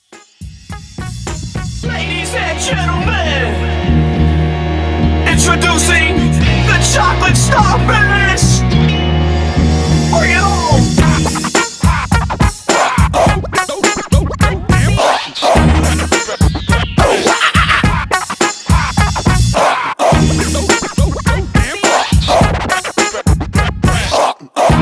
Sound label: Party Breakz Scratch 105 BPM